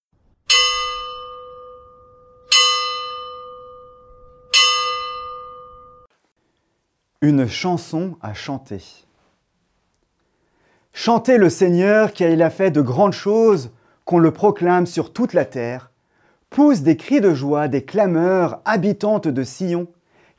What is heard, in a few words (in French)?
une chanson a chanter avec musique